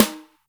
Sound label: Boss DR-660 Snare, Drum Machines